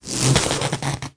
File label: Electric wave